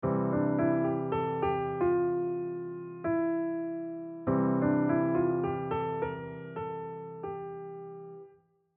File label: mixolydian pull